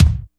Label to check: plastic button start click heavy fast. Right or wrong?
Wrong